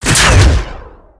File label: Shot